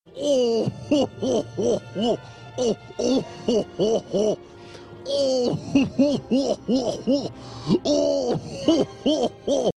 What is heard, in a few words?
laughing shark